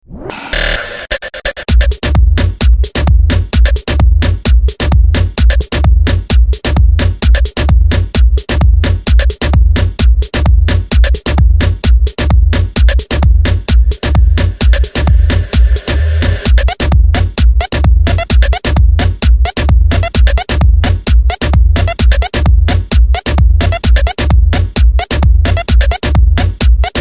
Scary Minimal Mix

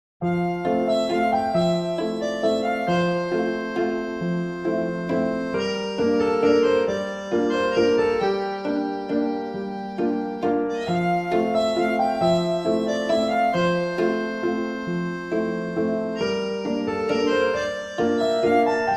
French Music

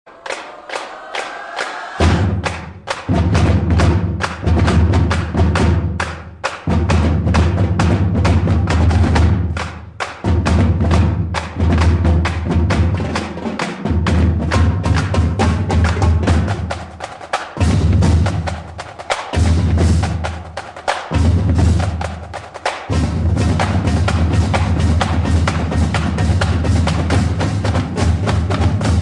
C High Drumline

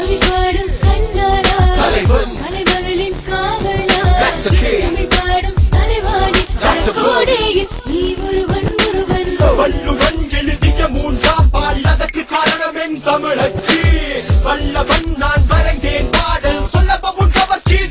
Tamilachi Rap

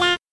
Duck; PewDiePie

